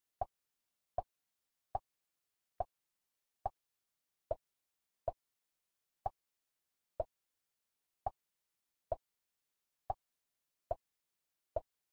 ui buttons; Subway Surfers

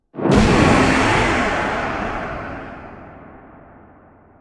upspell; hollow knight

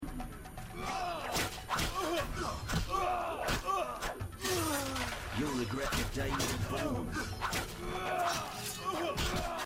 fight outside; The Witcher

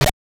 landing after jump; ducktales

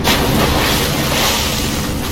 dodge water; Dark Souls III

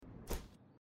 open backpack sound; Life is Strange 2; gamesoundopedia

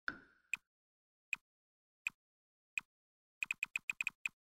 wall drag; sims 4

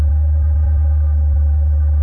ashenvale obelisk loop; Warcraft III Frozen Throne

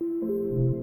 star missing; gris

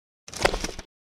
ui sound; We Were Here

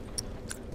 communication sound swap weapon

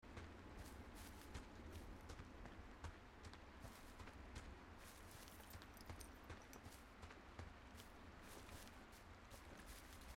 player walking normal; firewatch

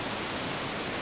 water waterfall loop; Warcraft III Frozen Throne

